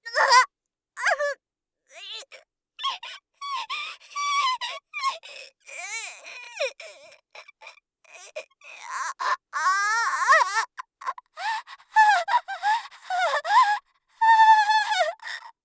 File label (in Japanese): 鋭痛; 効果音なし